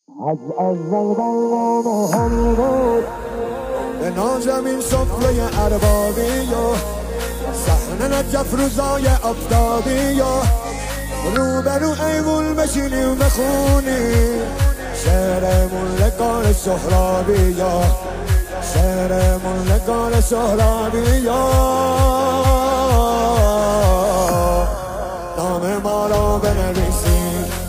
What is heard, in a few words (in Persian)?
مداحی